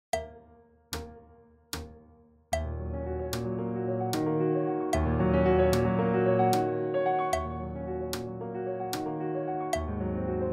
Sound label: piano fast; Uebungs-CDs; Playbacks